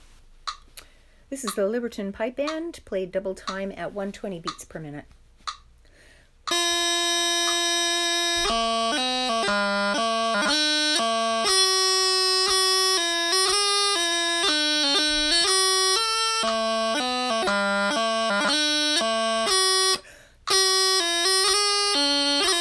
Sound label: Pipe Band